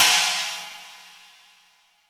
big room crash sound g key, Royality free crash sound tuned to the G note.